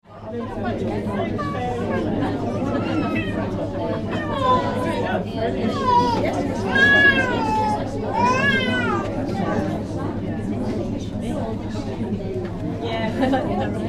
Reception Room Crowd, Large mixed chatter background; Banquets And Reception